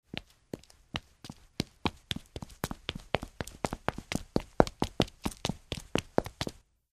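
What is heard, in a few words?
Footsteps Female Run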